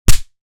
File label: Realistic Punch; Mark DiAngelo